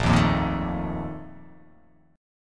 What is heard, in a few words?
A sharp Major 7th Suspended 4th